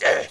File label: Blowpipe Native Attack; Playable Tutorial Level; TR3